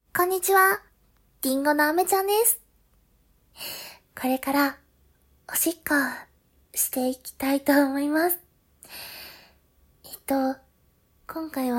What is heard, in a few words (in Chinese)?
尿在锡纸上; りんごのあめちゃん; 无修音ver.